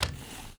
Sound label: drag step; DoomRTX